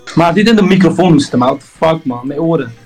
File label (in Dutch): microfoon hoeste